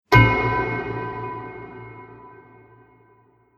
Treated Metal Hit; Metal Sound Effects; Impact / Explosion Sound Effect; Andrew Fleming

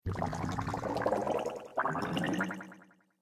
Water Space Bubbles, sci fi; Water Sound Effect; Splish, Splash, Splosh!

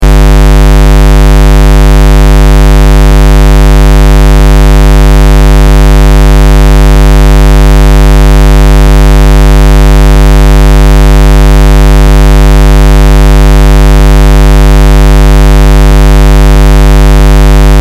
Test Tone Square; Square Wave 50Hz 60 Secs 0Db Loop; Technology; Blastwave

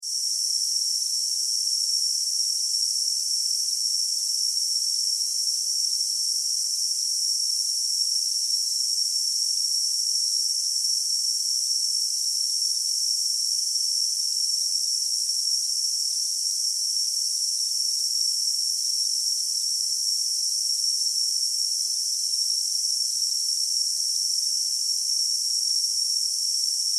Pneumatic Hiss Air, Pneumatic, Hiss, Air, Steam; 5.1

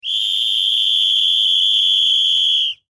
Whistle Blow Long; Sports; Whistles

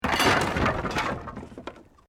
Wood Collapse

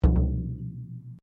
Bodran, Irish styled flat drum; Drums; Musical; SoundEffects-Foundation1

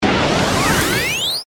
Hit Ascend; Production Element; Sound Design; BlastWave

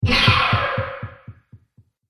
Scifi Weapon Shot, laser gun

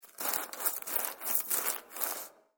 Warehouse Searching Through Garbage; Garbage Sound Effects; Foley Sound Effect; Andrew Fleming

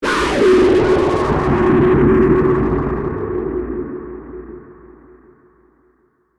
Production, Element, Distortion, Scrape, Long

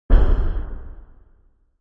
Flame; Flame sound for video editing, movements, logos, flash, or animation.; Sound Design

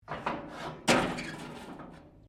metal rusty move; Materials

